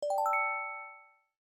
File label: swingdown start musicbox; LG Wing 5G SKT